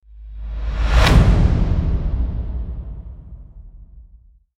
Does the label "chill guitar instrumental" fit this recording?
No